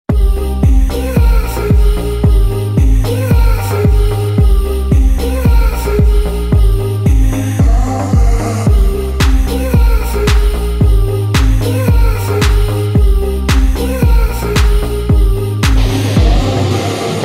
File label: electric guitar